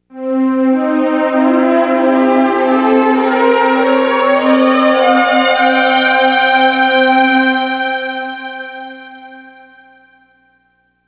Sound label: Moll I, modes